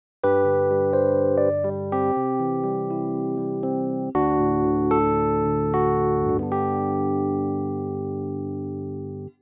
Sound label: authentic cadence